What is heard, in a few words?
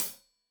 Hat Tight, Hi-Hat, Cymbals; Hat Tight 1; Stereo; Deeply Sampled Drums